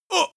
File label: male groan pain short soft; menschen; schreie